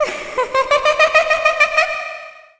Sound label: Queen Of Fairies Laugh Vanish